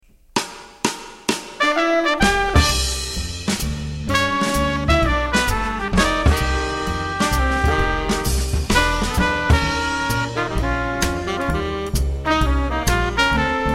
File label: Monks Moments no piano, jazz